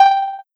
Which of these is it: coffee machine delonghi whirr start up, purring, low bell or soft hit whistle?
soft hit whistle